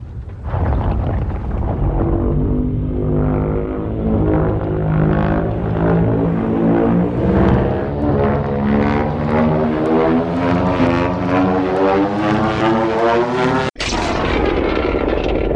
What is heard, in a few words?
charge